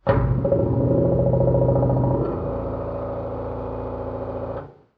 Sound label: nuclear pump; nuke